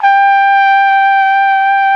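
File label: flugelhorn; BRASS; Best Service; Alpine Volksmusik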